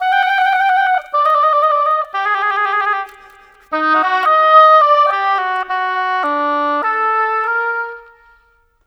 Cinematic Oboes; Sonic Foundry (Sony Creative Software); Orchestral Series VOL-3 - Cinematic

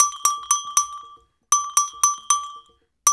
TAP, African; African Contemporary; Spectrasonics; Supreme Beats

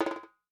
WALK DRUM, African Drums

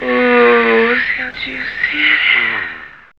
SEXVOX; X-Static Goldmine 1

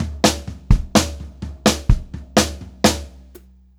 126 POP TOM, Drumloops; USB Soundscan vol.37; AKAI; Pop-Rock

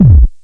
Roland MC-202

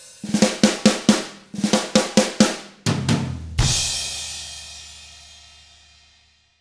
SLOW ROCK END, Drums; ILIO; Double Platinum Drums 1

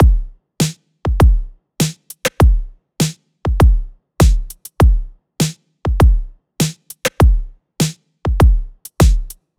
EDM Drum Loop 100 BPM; Dance; 99Sounds Music Loops; 99Sounds x Monosounds

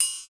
Perc Toys; Spectrasonics; Supreme Beats; World Dance

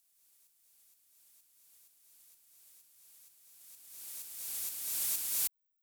FX Uplifter Noise; VEC5 Multi Loops

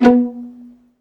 violin C#; ILIO; Synclavier Strings

